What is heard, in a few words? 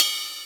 Ride, Roland R-8